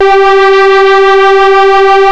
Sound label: PULSE G3, SYNTHS; OBMX-SYNTHS